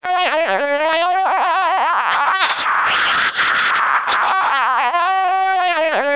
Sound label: siren